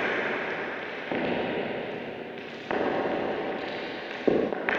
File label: Cracklature; sparse soundscape; Loops